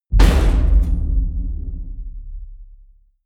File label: metal crash ring small